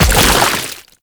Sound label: Land Heavy Sludge, Footsteps; Warframe; Tenno